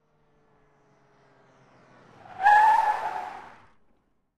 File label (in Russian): mjagkij zvuk tormozov